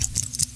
elite twirl; cstrike

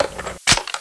sg550 clip in; cstrike

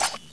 knife deploy, weapons; cstrike

